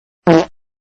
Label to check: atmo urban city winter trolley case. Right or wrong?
Wrong